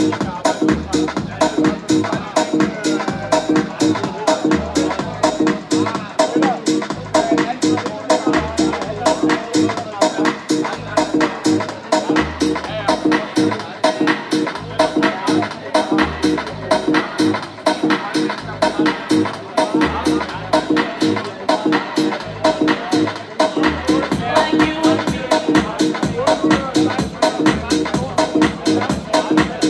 distortion

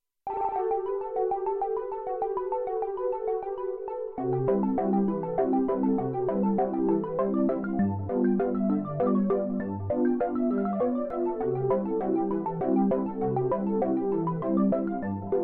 minute waltz animoog

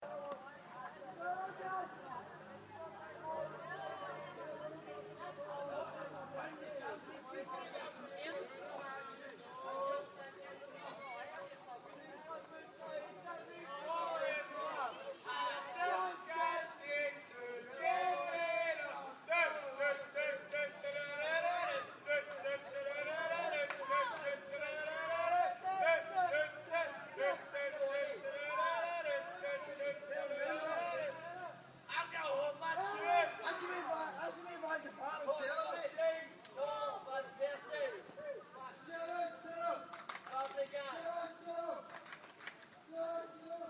celtic chanting

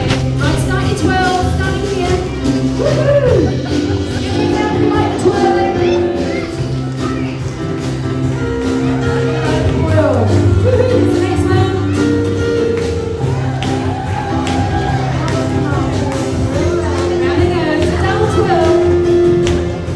ukulele jam madness

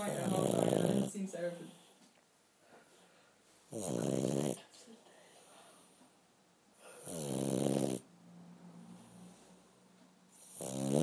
fast asleep

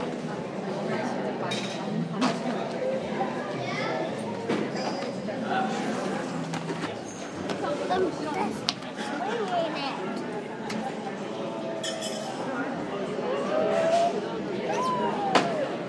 ambience cafe; joe columbia

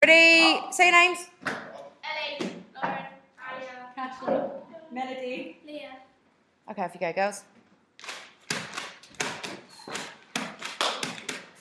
percussion

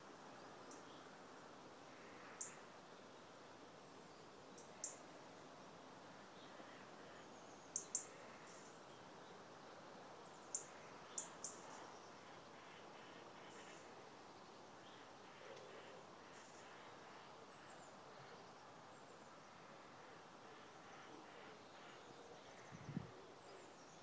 sound of morning birdsong

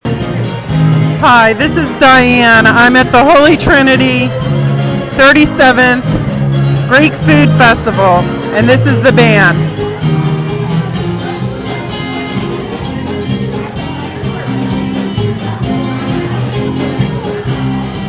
greek food festival; holy trinity 37th; north side pittsburgh pa

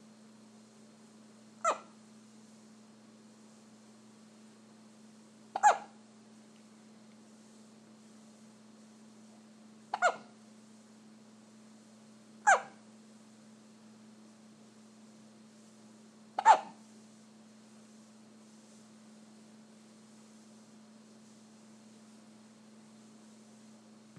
hiccupping

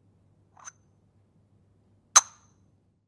ceramic cherry toothpaste pot opening and closing

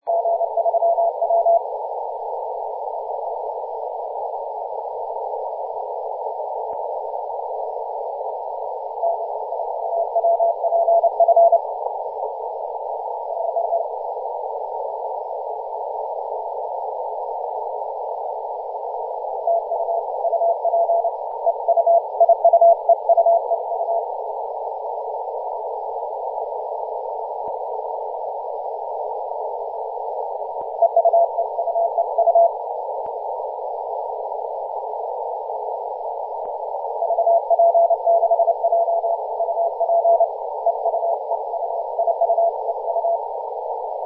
18.084mhz cw

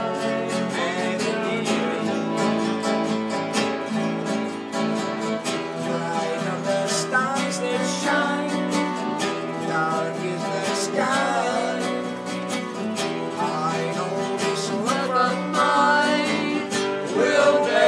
a man with a guitar and a man with an accordion; in bansko bulgaria

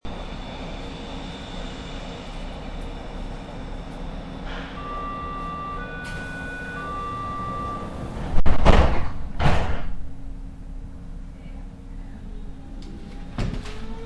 S-Bahn

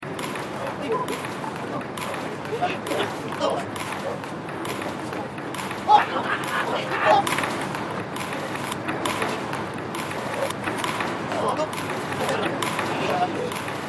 ping pong beats a spitalfields remix

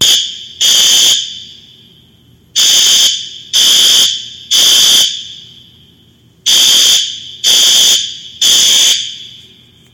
fire alarm

